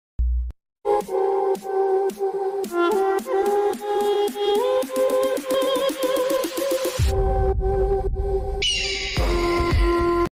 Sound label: birds animals wildlife nature snake ringtone remix music bgm ringtones